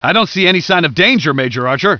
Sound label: landing area peace; gearbox; gunman